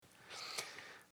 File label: smack breath; smackdown